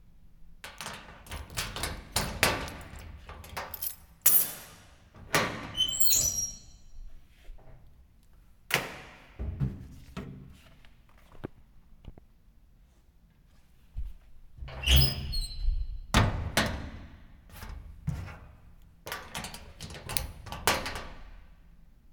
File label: Metal Locker